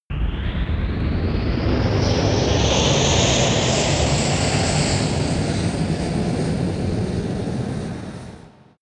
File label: space passing rocket; raumschiff